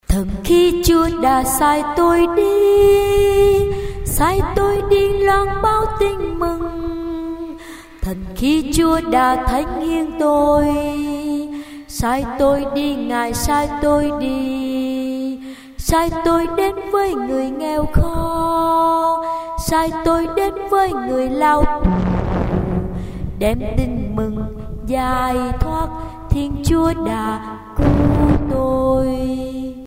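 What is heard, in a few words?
soprano alto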